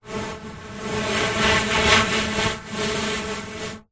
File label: human blow air over end card tube long blow change in strength; zapsplat